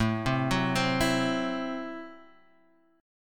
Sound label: A Minor 6th A Guitar Standard; Am6 arpeggiated; JGuitar